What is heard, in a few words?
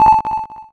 radar blip